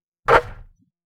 Basketball swish

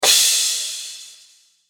cymbal crash

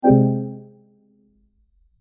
error alert

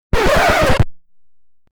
Record scratch

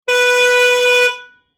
Car horn honk

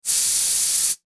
Air hiss

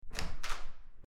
door opening

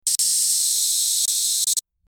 Tech gadget buzz

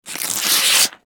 paper tear

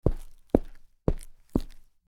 footsteps on concrete

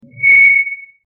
Microphone feedback

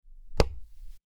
body hit

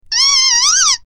Squeak

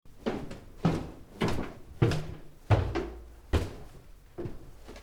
Footsteps on stairs

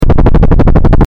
Helicopter flying; Sound FX

